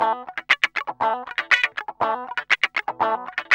GUI, LICKS; 120 LICKS

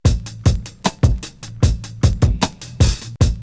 BEAT, Hip Hop; E-MU Formula 4000 Series Vol. 1; Hip Hop Nation; Beats 59-88bpm

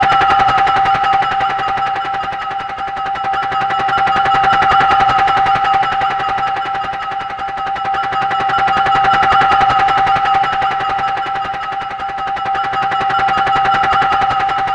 Propeller Haze C, Propeller Drones; Zero-G Seismic Frequencies

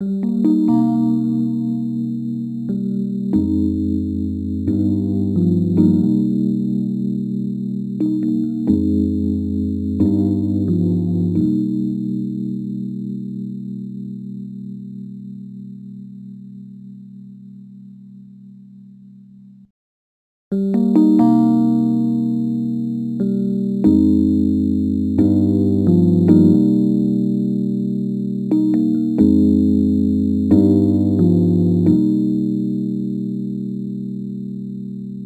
Rhodes Attacks; Ueberschall

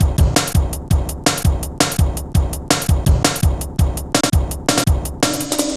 Bouncy, Jungle, Drum Loops 166.5; Zero-G Jungle Warfare 1, 2 & 3

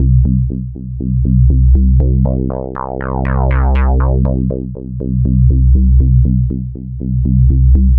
Synth

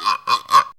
GUIRO SCRAPE, PERCUSSION; Zero-G Ambient 1

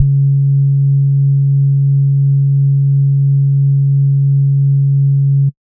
SBASS C#2, Sub Bass Sine; neuro; Stanza

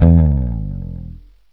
E.BASS

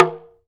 TALK DRM, Heart of Africa; Spectrasonics

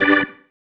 Stab, Organ, One Shots

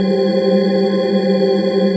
DEEP T C5, SYNTH; Q Up Arts; Dream Experience

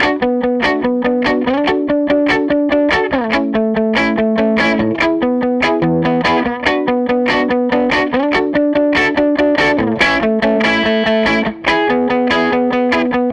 SG Guitar Loop; Sonic Foundry (Sony Creative Software); Six-String Orchestra

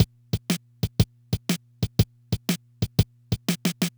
Loop, dd-5

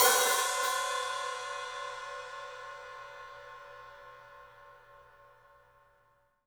Studio Hats, 3DPercussives

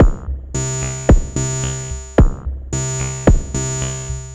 MODU C, Modular Synth Loops

